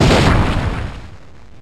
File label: Splintered Stormshards; pokemon reborn e19 2022